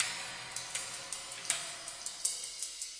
160 tripping brush cym verbs, drum loops and breaks; 141 to 160 bpm